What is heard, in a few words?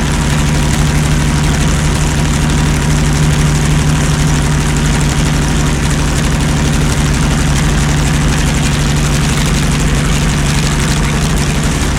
panther engine rpm load, engines tanks; War Thunder